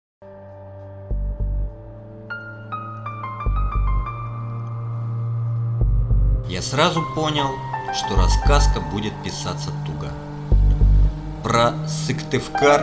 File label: Hard IDM